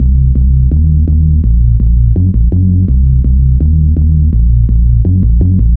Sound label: Menace Throb Bass, Bass Lines 166.5; Zero-G Jungle Warfare 1, 2 & 3; 90 s Sample CDs